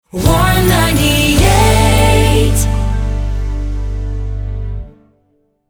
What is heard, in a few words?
Slow 071bpm D Rapid Fire, jingle; Warm 98